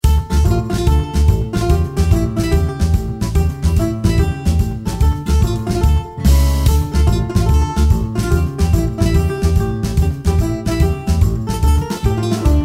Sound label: Village Tarantella